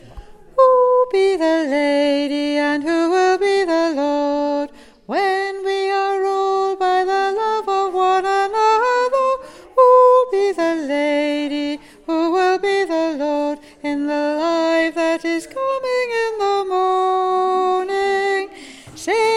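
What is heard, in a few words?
Soprano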